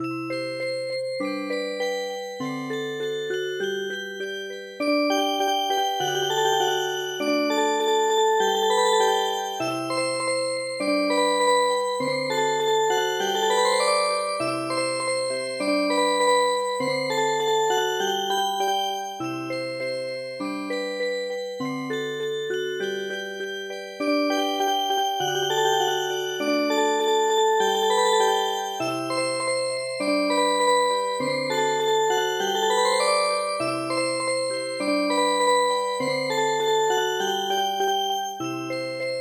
swing Music Box; ドイツ民謡の「ぶらんこ」に癒し系のアレンジを施したオルゴール曲; アップテンポな4拍子のドイツの童謡です; ＰＣ ＭＵＳＩＣＢＯＸ

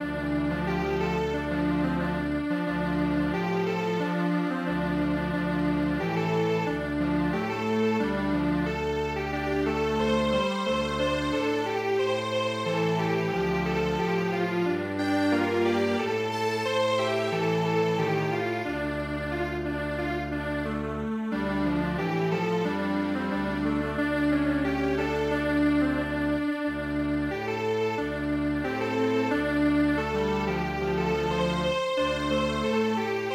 chonko bushi Strings Quartet

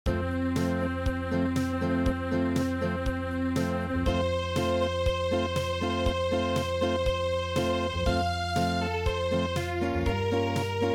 Marche, Acoustic; midi; traditional

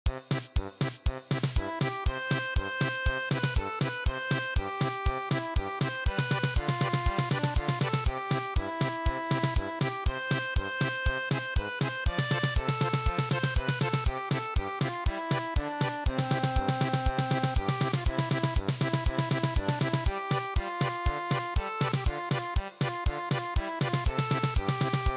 German Polka

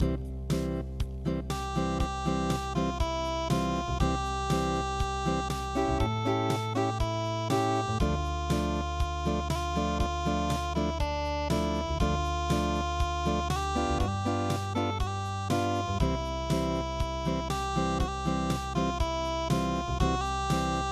unpluged

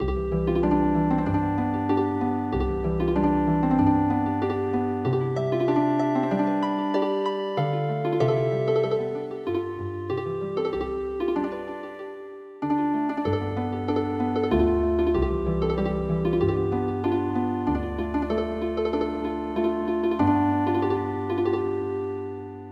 Drawing Healing Harp; midi; traditional

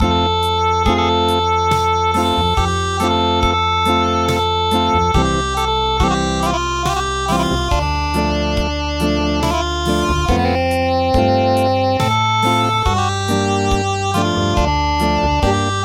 yan yan hakkei Acoustic

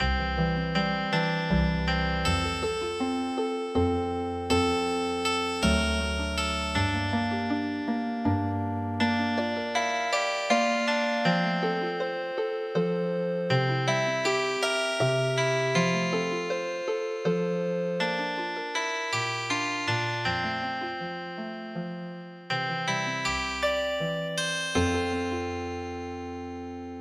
step da step krugom Healing Harp

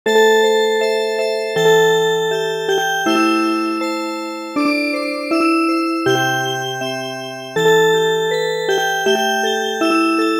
Music Box, midi; Hame Hame Hame

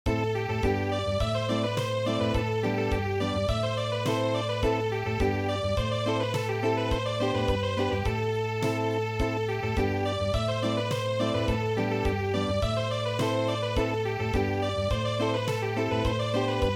Acoustic

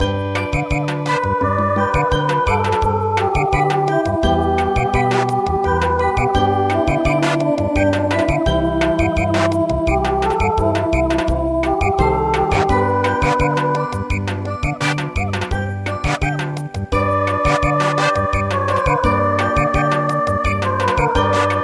Samba, midi

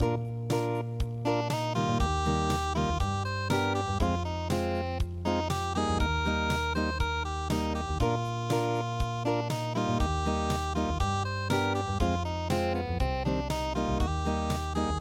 unpluged; Londonderry Air

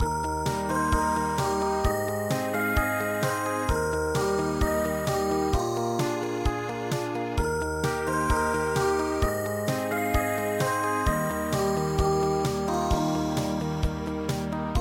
My Dear Old Sunny Home Ballade

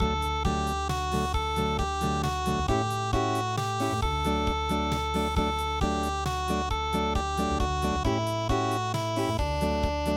unpluged; Ladybird Ladybird

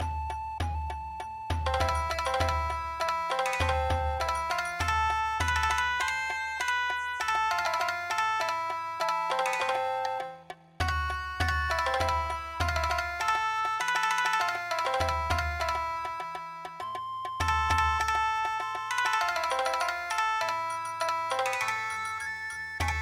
saitarou bushi debayashi

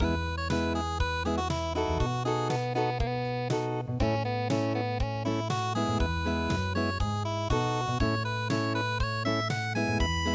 unpluged, midi

